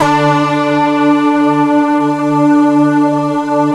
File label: SYN SEQ, Roland; Sound & Vision - Gigapack I CD 2